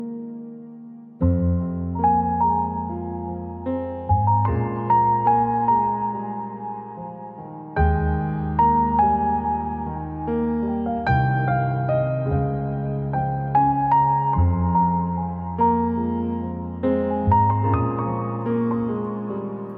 Instrumental Piano Ringtone

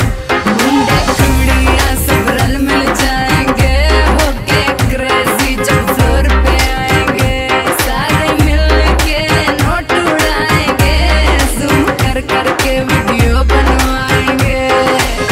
Remix Bollywood Song Ringtone

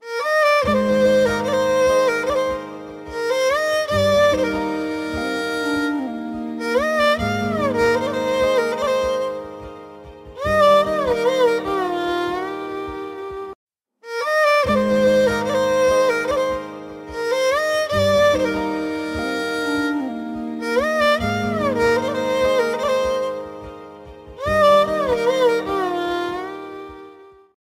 Malayalam Movie Ringtone; ringtones; Ishq

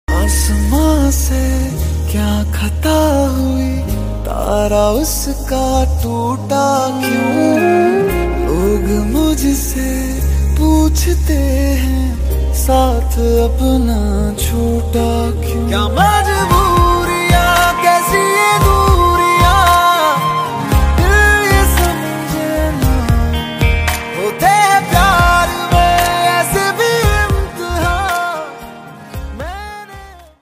Sad Song Ringtone

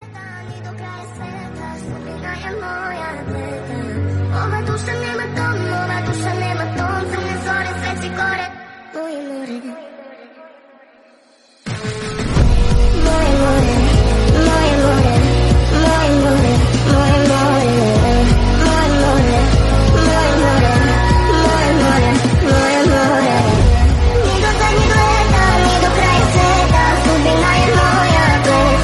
Bgm Ringtone

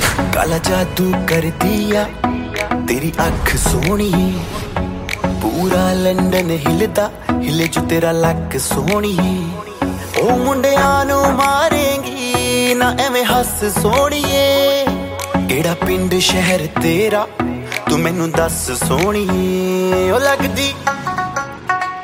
Ringtone

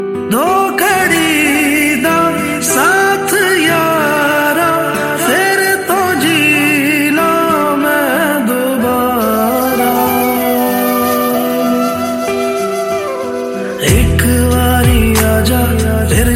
New Sad Punjabi Song Ringtone

